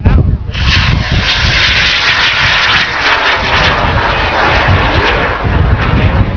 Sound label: rock, rockets